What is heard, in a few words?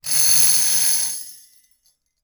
tambourine2 thumbroll ff stereo, Tambourines; MIS Pitches - 2014; Percussion